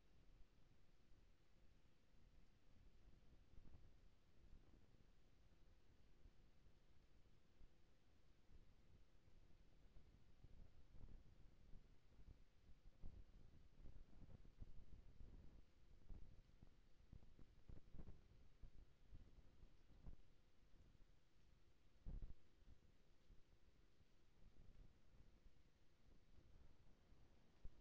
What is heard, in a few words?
HYDROPHONE 16000Hz; JASCO AMAR HYDROPHONE 740